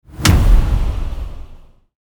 Grounding Hit Epic; Ninja Tracks

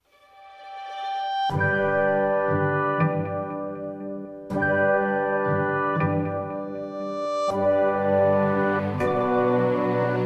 Dozy Ambient

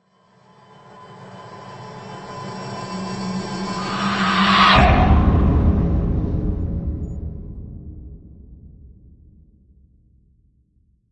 Ghost Trip Tonal; Ninja Tracks

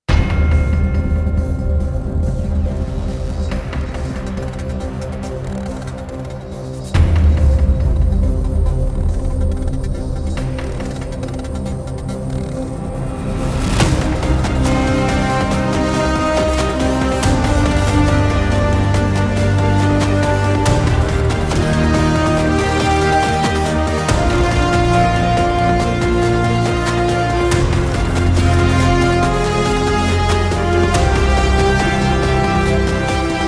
Evolution (No Choir)

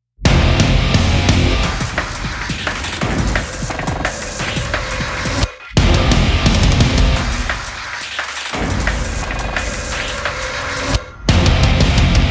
Epic Speed Metal, No Choir; Gothic Storm Music

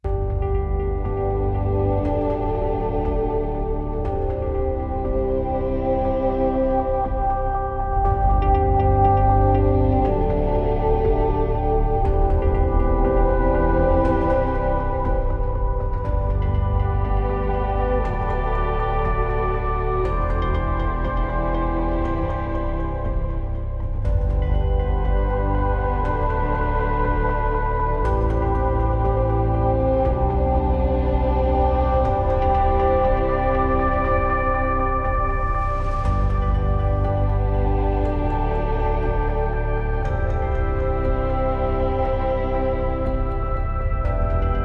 Joy Ambient

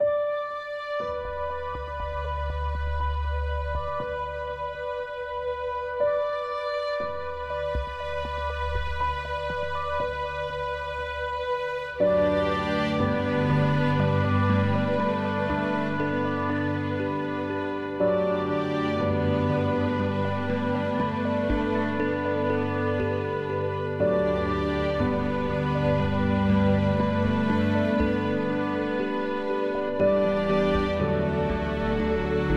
Neat Ambient

